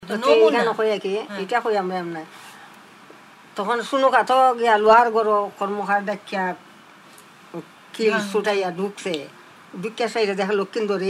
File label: Padmopuran chorus